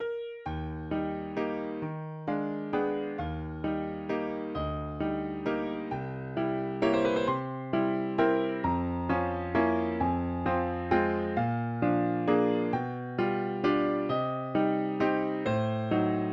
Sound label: piano; YDP